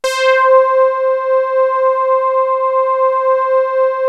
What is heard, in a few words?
FAT SAWZ; MORPHEUS